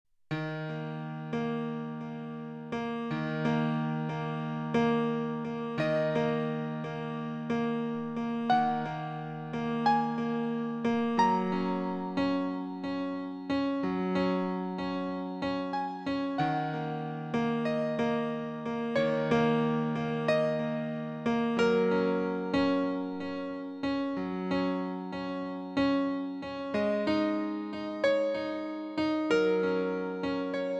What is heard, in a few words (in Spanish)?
escalas